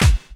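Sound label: snare drum bass drum, Dance Mega Drums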